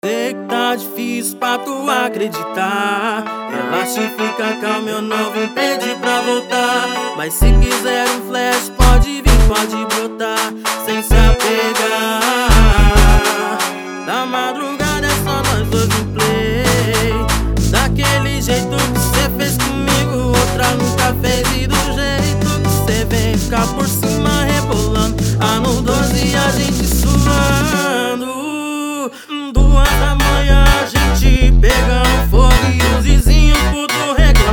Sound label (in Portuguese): funk sertanejo